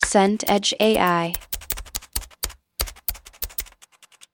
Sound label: typing fast